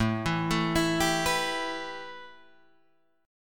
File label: A 7th Suspended 2nd A Guitar Open D, chord